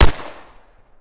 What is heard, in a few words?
gunfire; weapons; br85